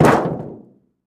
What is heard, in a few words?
Car Hood Drop On Groun